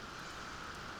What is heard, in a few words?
Background Noise